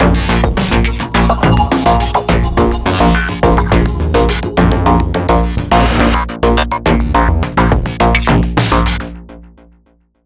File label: Fight Low Combo; Remember Me